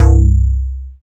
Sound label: BASS MONO